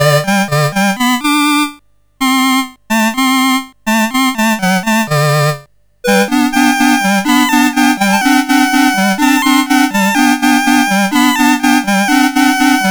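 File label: Little Night Music, MOS 8580; CSG8580R5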